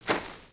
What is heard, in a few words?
Footstep; Lego; Strombie; creatures organic